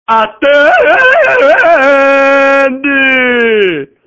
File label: Tarzan